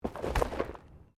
Body movement Slide Gravel

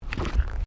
Footsteps Decking Wooden Step; Crocs; Footstep Foley; Human

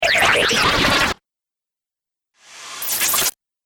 Dubstep Scifi Effects

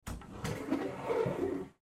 Closet Track Door, Closet Track Door Open Slide; Household; Closet Doors; Blastwave

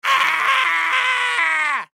Horror Scream Male; Screaming; Voice

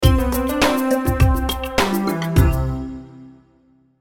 Musical Beat, Musical Beat Tribal Keys Melody Low; Miscellaneous Musical; Blastwave

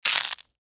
Human Bite Food; Bite Food Eat Crunch Rip Tear; Eating; Blastwave

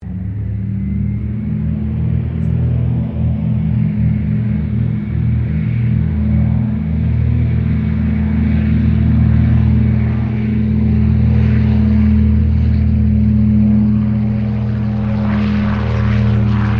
AIRCRAFT DOUGLAS DC3 TAKEOFF; 1945 DC commercial aircraft; Airplanes (Sound Effects:Vehicles)

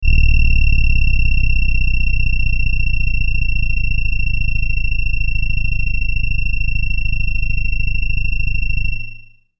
Chopper High Buzz; Blastwave; Chopper Sound Effects

